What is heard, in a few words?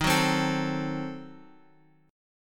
Eb Diminished 7th, Guitar Open F; Ebdim7 strummed; x,x,3,1,3,4; JGuitar